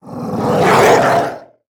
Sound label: Felhound Play